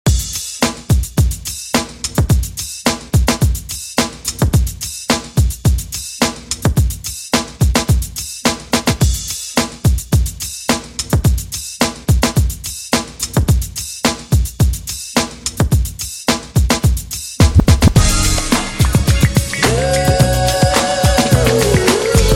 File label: Funk Redrum, 107 Bpm